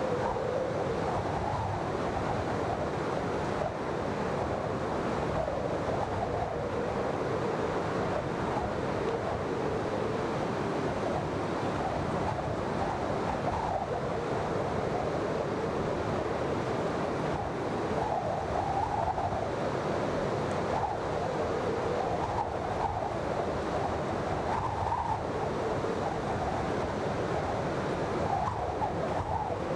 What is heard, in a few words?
blizzard, ambience